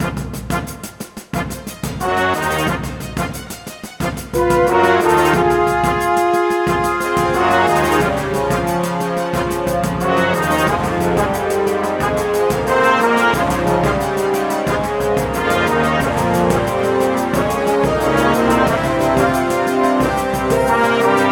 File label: Cafe Battle